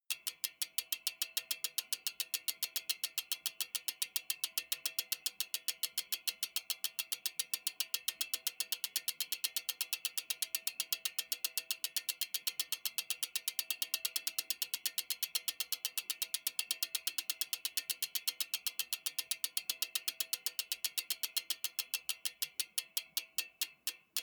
bmx bike freewheel constant very slow

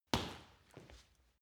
punching bag kick soft

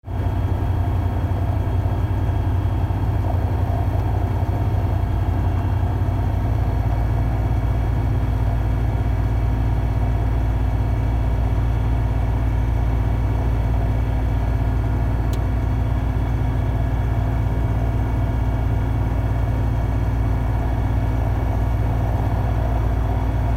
motorized glider takeoff

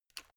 headphone extend single short

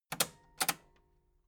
metal button cassette stop slow

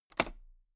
toolbox latch open loose

